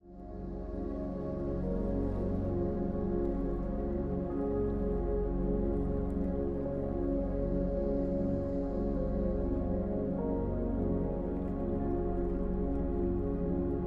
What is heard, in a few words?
Relaxing Music